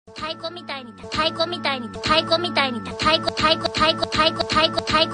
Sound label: taiko; rocky says